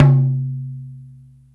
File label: SKRA, ETHNIC PER; AKAI S-Series CD-ROM Sound Library VOL-5